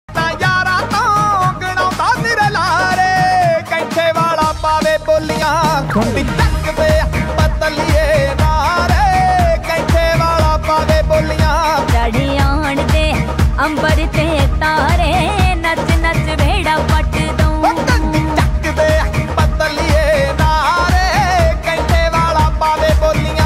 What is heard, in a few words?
punjabi ringtone